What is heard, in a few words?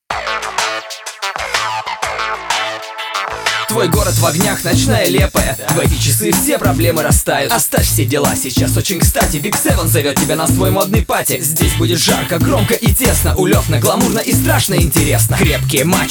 rap